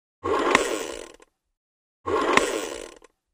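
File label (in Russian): детонатор для динамита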